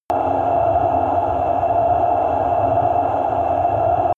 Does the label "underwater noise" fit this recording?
Yes